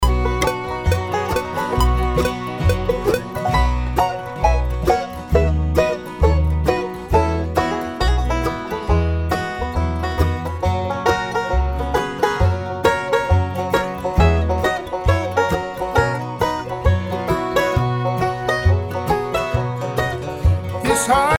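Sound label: banjo solo, three quarter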